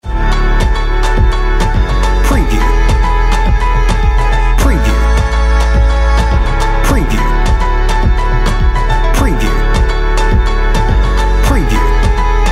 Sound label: On Hold Music